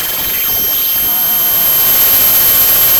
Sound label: pop, Sound of a cat meowing